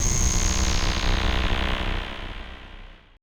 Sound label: AMBI FX